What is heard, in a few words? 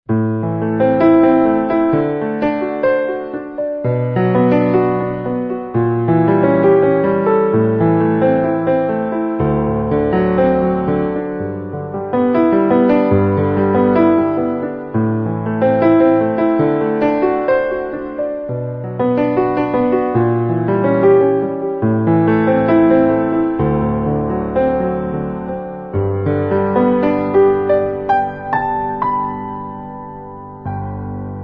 Classical Piano